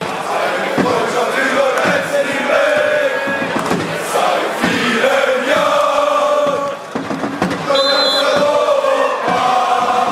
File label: fanchants, football chants; eine freundschaft uber grenzen hinweg